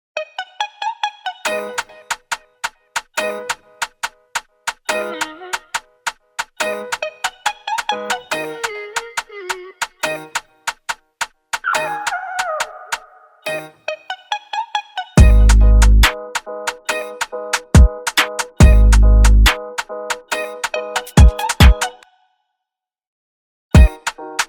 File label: instrumental